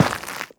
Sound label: step, footsteps, gravel